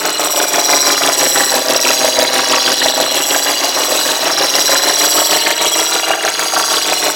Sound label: Swarm Drone Engine Loop; Warframe; Corpus